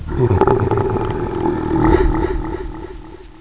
laugh

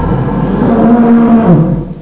garg alert

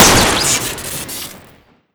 jam shoot crit; weapons

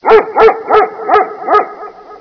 dog, ambience

